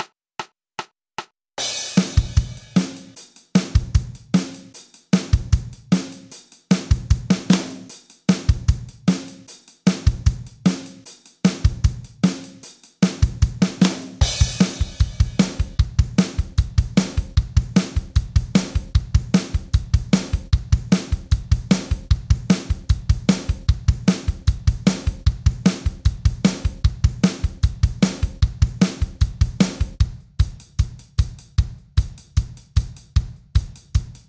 Batterie 154 Bpm

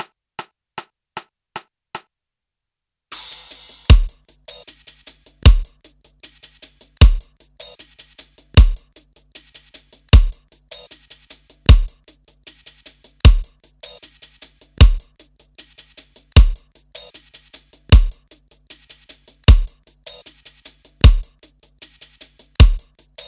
Batterie 154 Bpm

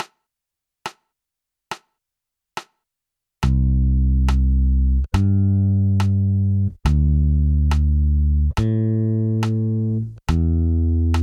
Quinte Juste

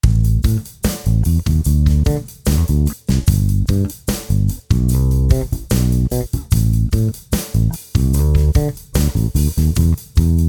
Basse Batterie 74 Bpm

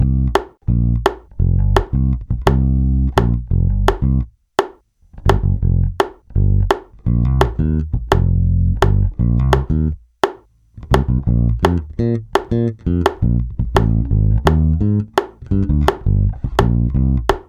Basse Métronome contretemps 85

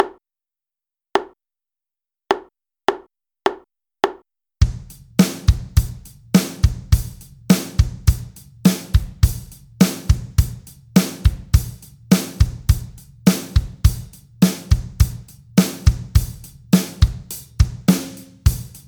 Seconde maj Batterie 104 Bpm

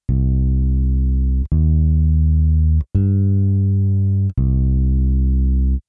Triade C min

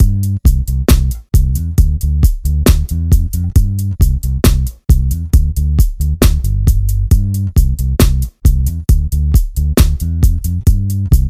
Basse Batterie 135 Bpm

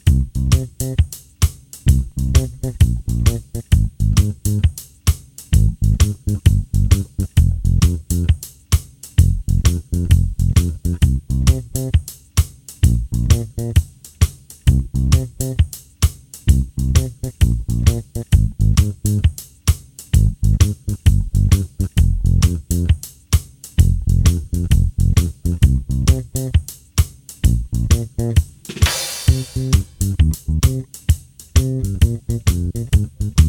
Basse Batterie

